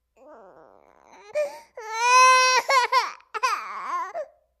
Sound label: Baby: 10-month-old, Crying. Interior.; Mono